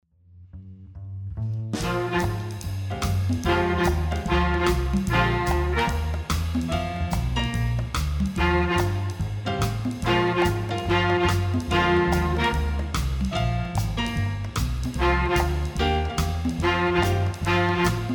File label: Orchestra; Thailand, SWU Orchestra